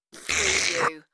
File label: Slurp